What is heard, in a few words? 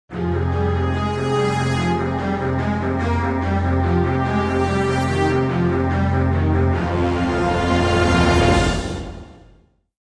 Industrial Agitated; Civilization Revolution; xbox